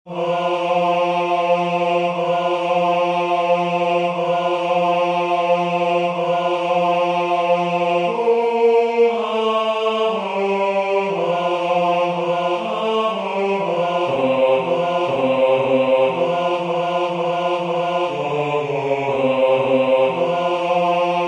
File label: rocking bass